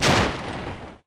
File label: Cannon Hit Ship; Pirates Of Treasure Island